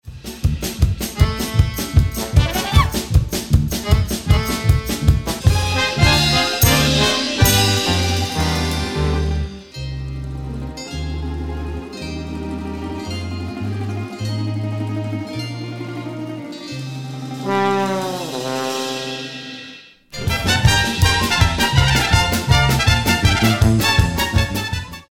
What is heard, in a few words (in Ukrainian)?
Minusovka Popuri ukrainskih pisen